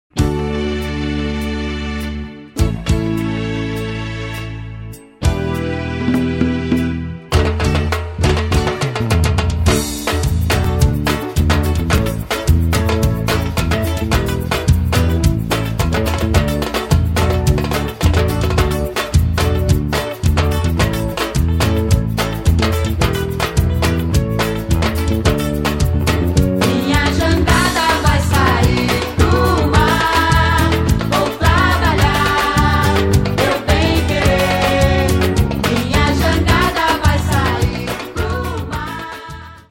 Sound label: back vocal